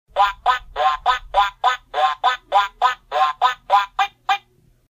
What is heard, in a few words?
quackquack, duck